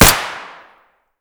glock17 indoor close; Escape from Tarkov

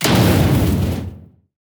damage hits explosions, Warships

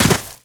land dirt sand, footsteps

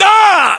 pain; english

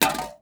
can

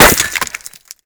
impact masonry; Modern Warfare

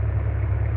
Technic Engine; DCS

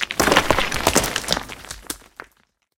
tank impact building

